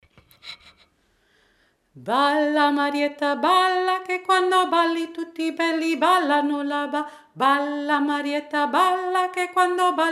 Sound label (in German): marietta langsam